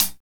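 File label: closed hat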